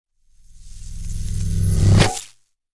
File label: Science Fiction Magnet Attract Metal Impact Super Power; Electrical; SFX Bible